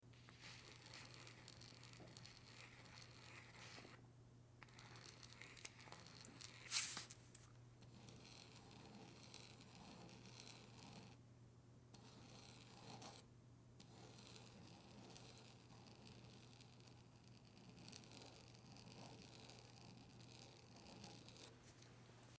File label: pen drawing